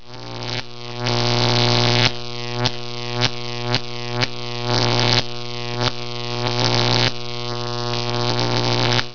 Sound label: electric arcing